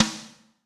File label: Snare